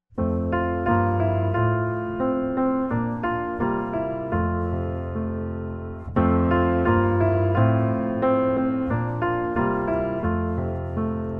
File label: piano